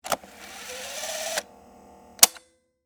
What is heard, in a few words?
Technology, Tape, Cassette, Player, Rewind, Tape, Panasonic, Handheld, Recorder; Blast Wave